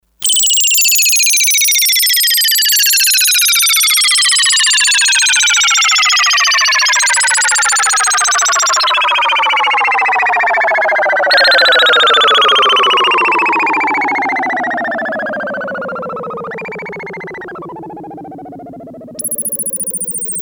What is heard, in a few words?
Chirp dive, Sequential Circuits Six-Trak; Factory Programs